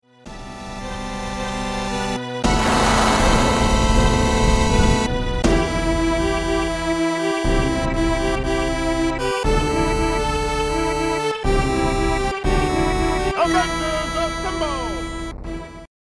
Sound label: 8 bit trailer; avengers infinity war